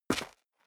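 step sneaker concrete grit single right, footsteps; schritte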